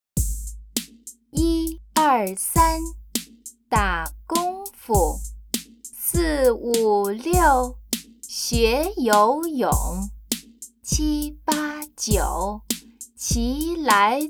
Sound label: Chant